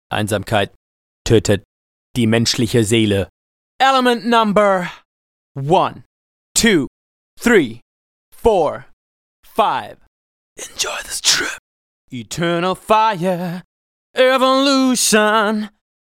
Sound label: Fab Acapella; Techno Trance Essentials